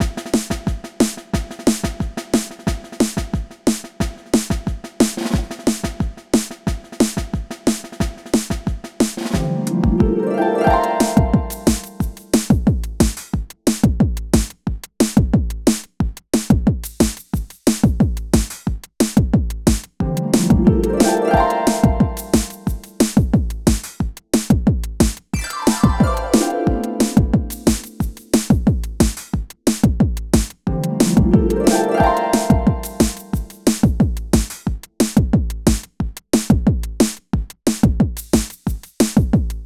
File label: Long Jump (Drum Layer); Wii Play Motion; Wind Runner